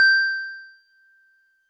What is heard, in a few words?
Wood Piano DX100 G6